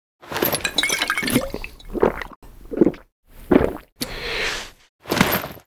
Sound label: yasuo skin recall start; LoL; yasuo skin01